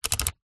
vehicles car fan speed dial turn clicks toyota rav4